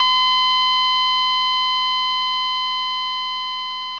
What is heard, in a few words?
flatline; fvox; hl2